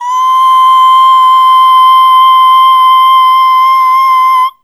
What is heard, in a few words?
Aah Long C4 Dry; Harmonic Building Blocks